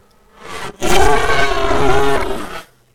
Synthetic Bear Growl

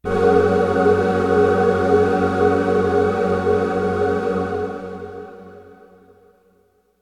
Dramatic Musical Sting

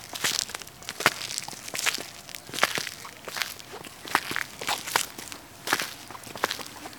Wet Dirty Shoes Walking On Pavement Loop

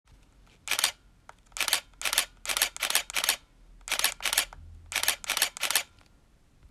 Camera Shutter Clicks

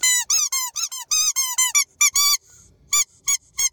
Squeaky Toy

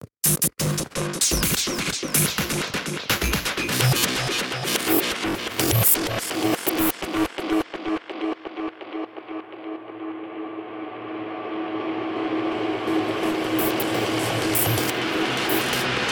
Fractal Drum Loop

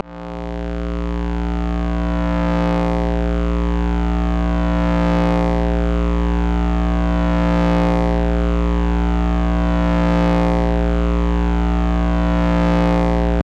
Long Lightsabre Buzz

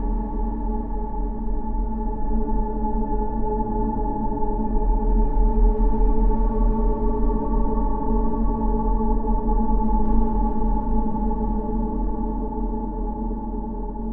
Dronescape

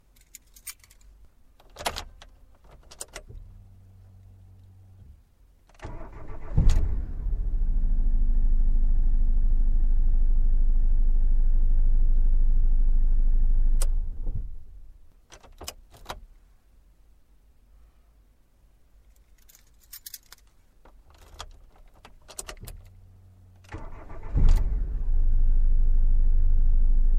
Starting Car From Inside

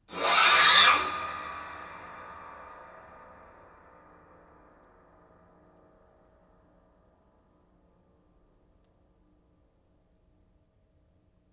Scrapes

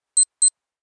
Time Change Sound In Casio Watch

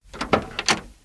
Car Door Open

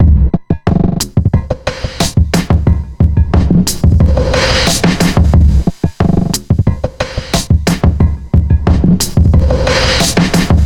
90bpm 4 bar Busy reverb congestion

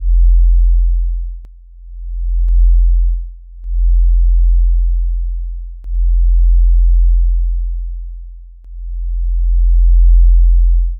Low Bass Throb

